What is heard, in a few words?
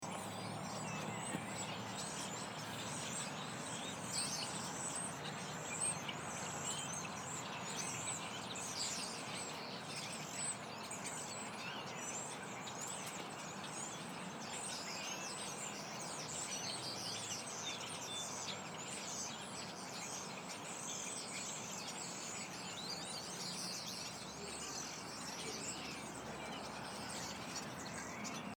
birds